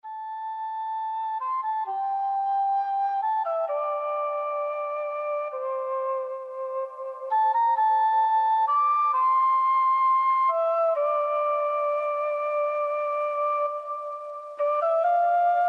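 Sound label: Reiki meditation (bird); yoga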